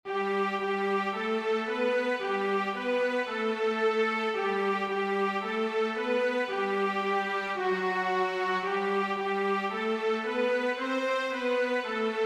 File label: no piano; Yankee Doodle